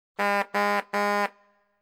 Horn, Angry Motorist; BBC Sound Effects Library

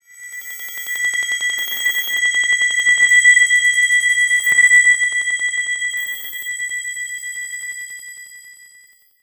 eternity synth chimes texture; Ethereal Ambient; BluezoneCorp

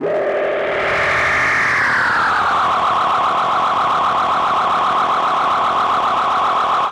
Feed driven, Feedback FX; 99Sounds; Dark Engine

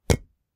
stone hit; Kenney's Sound Pack; Foley Sounds; Rocks

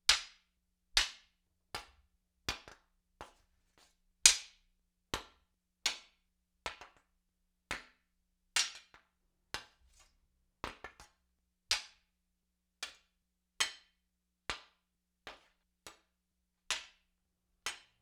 Spear Norse Sword Haft on Blade, Weapon on Weapon; StillNorthMedia

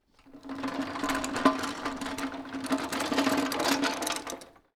Metal Trolly; 99Sounds; The Warehouse

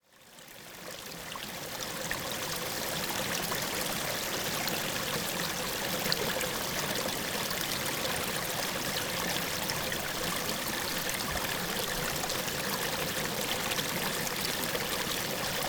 Calm small river flow (close perspective); Sound Ex Machina; Water Flow

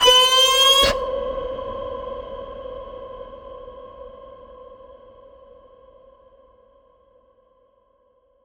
Ping Cinematic Bend Simplicity; DSGNMisc; MatiasMacSD; Informer

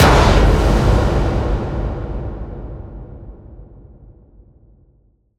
impact; Bluezone - Nightfall - Scary Sound Effects And Ambiences

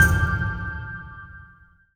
collect item hurry out of time; Gamemaster Audio; Fun Casual Sounds

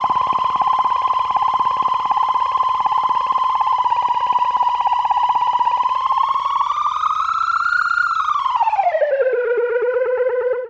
Feed liquid, Feedback FX; 99Sounds; Dark Engine

